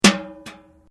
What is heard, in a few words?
Bucket Metal Clank, Common bucket; Materials